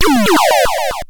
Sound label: Coin; Side Arms